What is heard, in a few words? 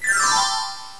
BELLS; Widget Workshop; A Mad Scientist's Laboratory; Maxis